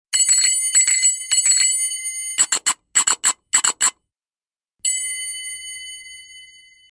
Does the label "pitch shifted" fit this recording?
Yes